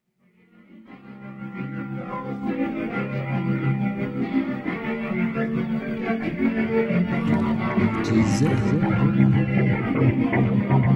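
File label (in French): sans piano voix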